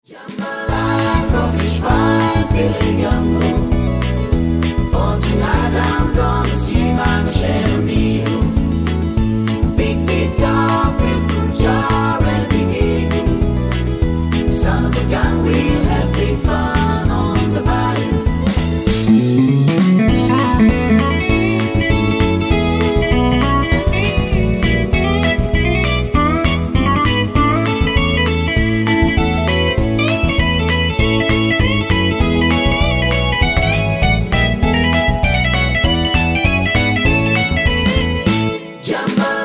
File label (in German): mit Chor